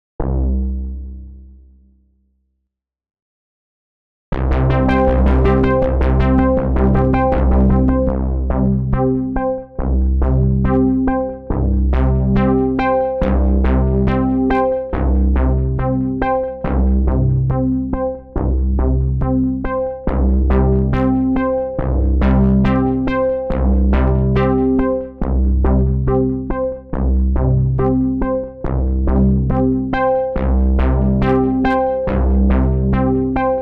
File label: Pedal Delay Synth Digital Ambient